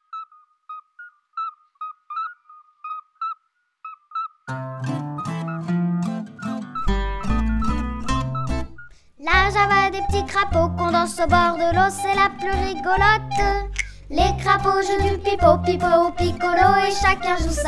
La java des petis crapauds SONG

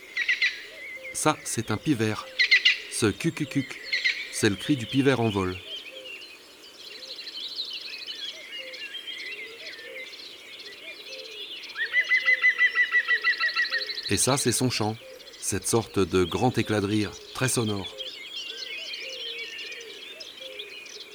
Pic vert ABC des chants d'oiseaux

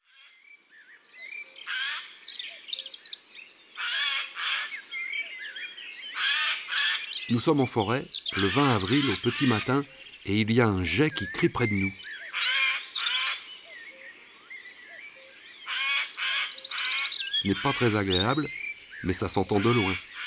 Geai des chenes; ABC des chants d oiseaux

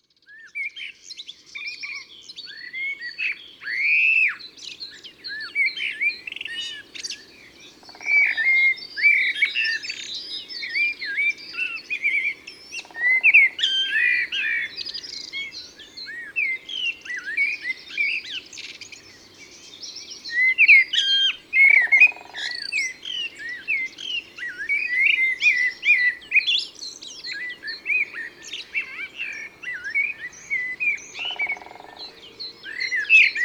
Merle noir; Isere; Concerts naturels de l'Isere; Studio Les Trois Becs

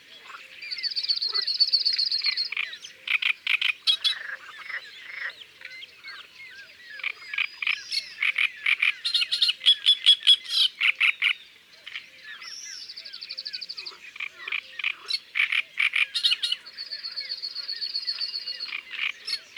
Bruant zizi au second plan; Isere; Concerts naturels de l'Isere; Studio Les Trois Becs

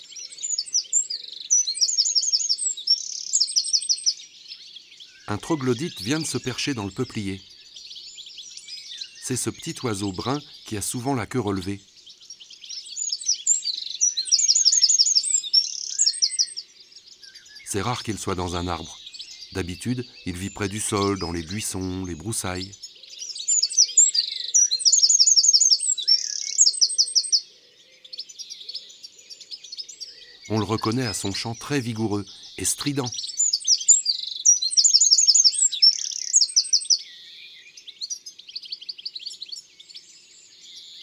Troglodyte mignon; ABC des chants d'oiseaux

